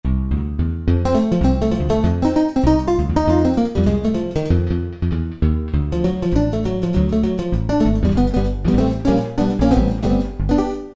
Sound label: Picked Guitar Duo; Factory preset demos